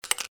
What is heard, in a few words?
electrical switch